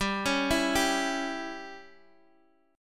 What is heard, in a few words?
C sharp Diminished, chord